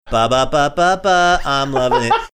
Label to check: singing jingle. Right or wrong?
Right